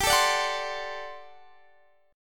G Major 7th Suspended 2nd Suspended 4th G down Guitar Standard; GM7sus2sus4 strummed